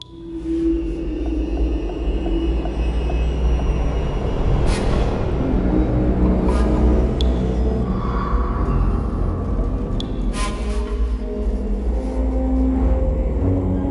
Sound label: catacombs - lower level